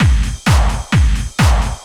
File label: techno, DRUMLOOPS